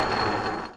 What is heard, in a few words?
Chain Gun End Roll; Defiance